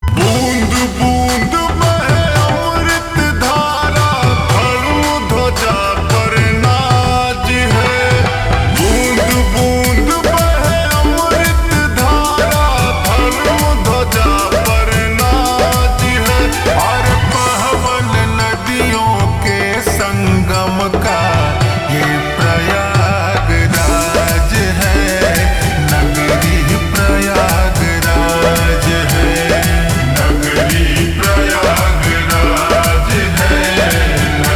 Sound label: Ringtone Slowed Reverb